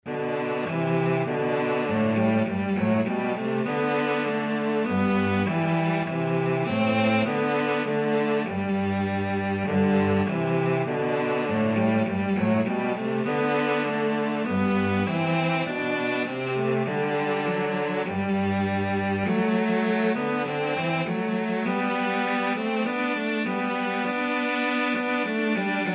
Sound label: VLC duet, cello duet; cwm rhondda